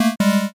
error x

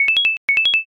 mobile ring

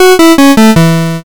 gameover

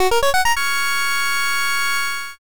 levelup big

